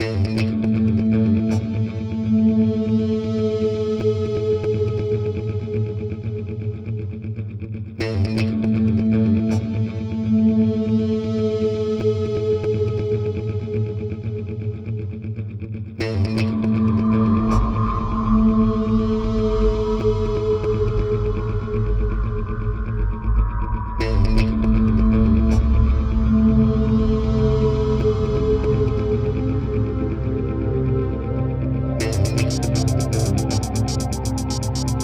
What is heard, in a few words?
techno; allegiance